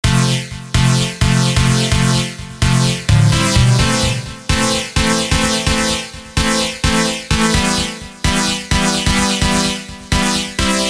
Winter Brass

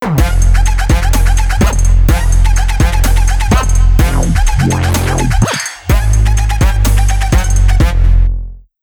Trap spark Logo

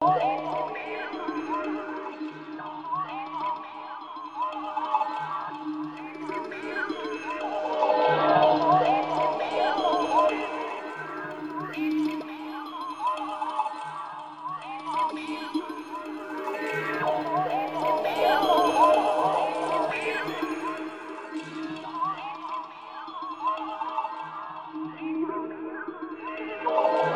Yamaha Motif XF7

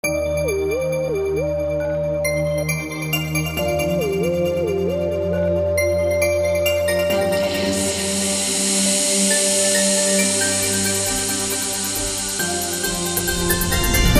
Sax; для МТС

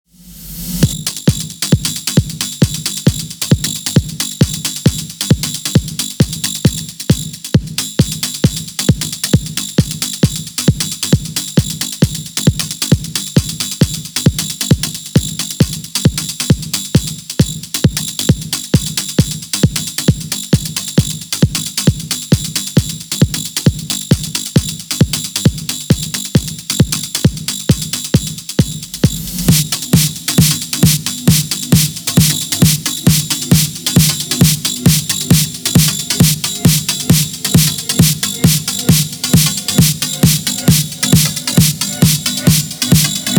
Live, Electro Progressive; Live at Ultra Music

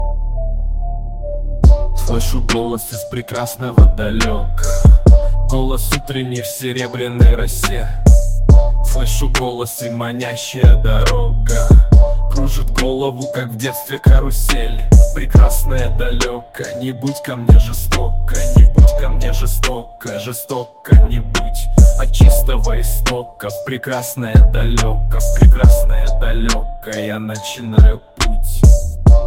Gangsta Shit Rap

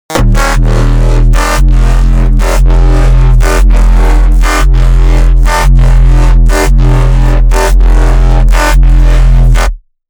deep neuro wobl

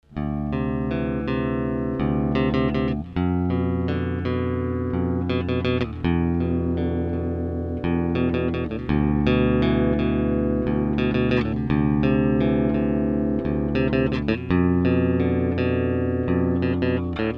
riff

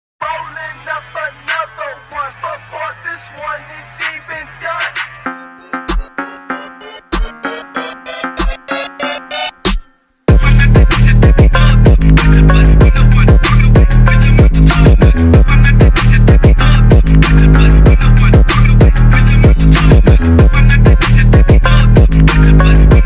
Phonke

